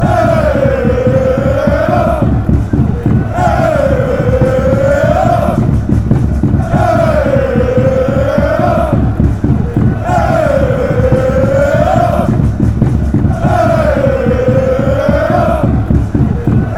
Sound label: ayyyyyyya, chants